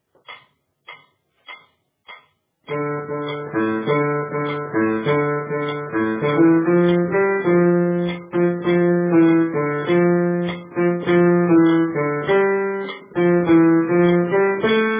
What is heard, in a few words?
Forgot Who I Am Bass; Accompaniments